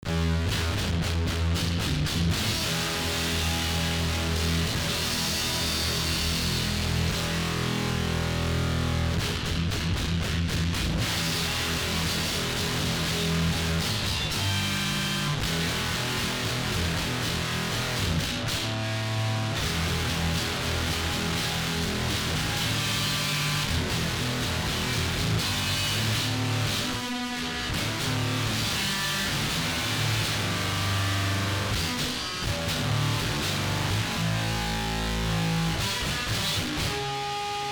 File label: loud tone test